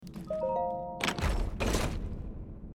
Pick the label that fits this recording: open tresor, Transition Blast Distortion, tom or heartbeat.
open tresor